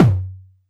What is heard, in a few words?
Tom Low